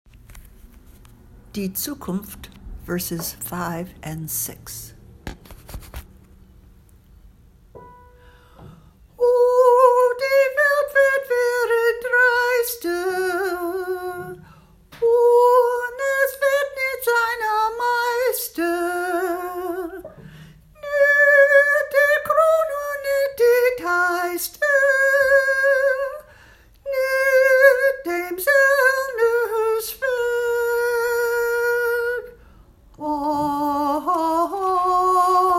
Alto, chorus; verses 5-6; Di Tsukunft

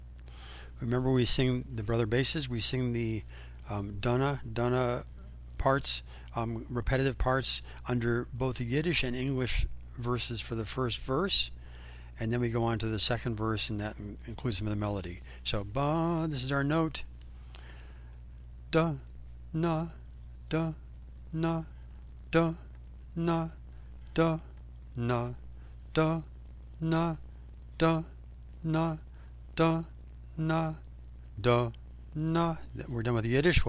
Bass

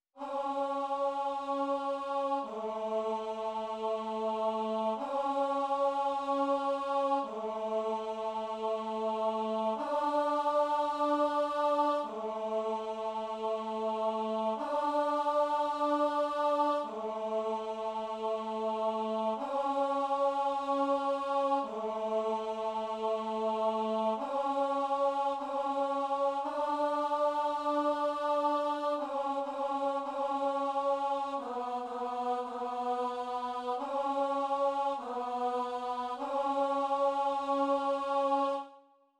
tenor; This Little Light of Mine

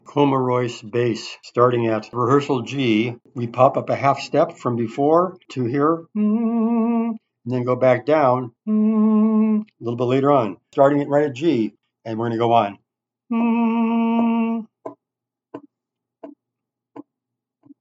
basses, chorus; Kum Aroys

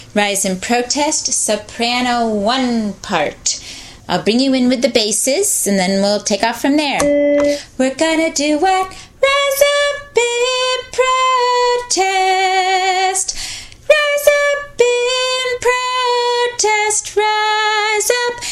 soprano I

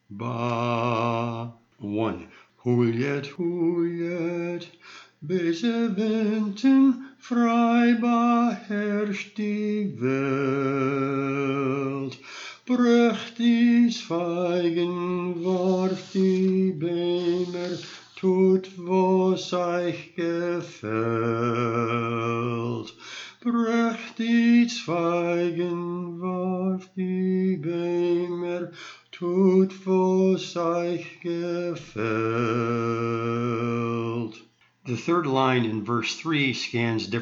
bass melody, chorus; Hulyet

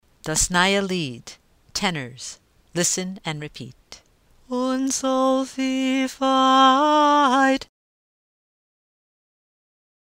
tenor drill, chorus; Listen and repeat

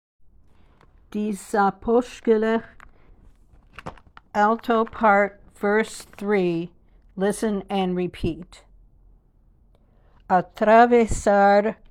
Alto listen and repeat; Spanish listen and repeat; Di Sapozhkelekh

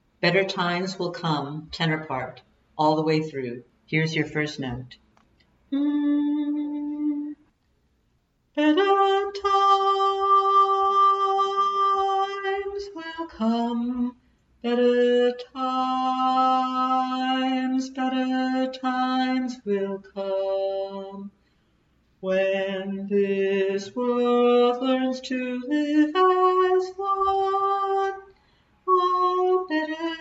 Better Times practice version tenor

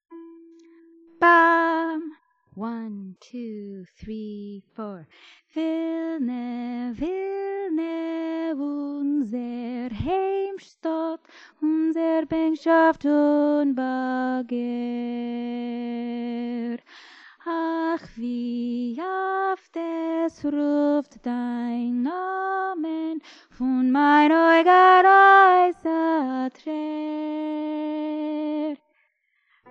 Alto; Vilna

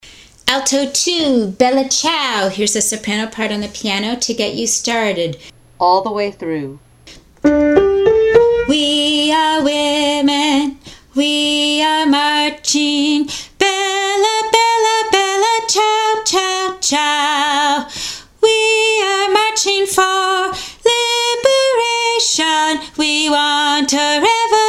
Alto II; all the way through; Bella Ciao

